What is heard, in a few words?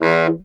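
Baritone Sax Staccato E1; Orchestral; VarazdinOrchestral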